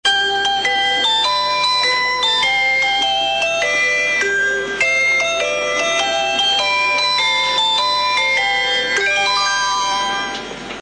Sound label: train chime; かもめ; チャイム２; “白いかもめ”こと８８５系のチャイムです。; チャイム１〜４は“白いかもめ”こと８８５系のチャイムです。